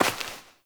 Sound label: Step Dirt; Warframe; Koumei